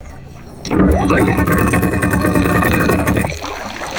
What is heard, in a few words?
small outboard boat engine false start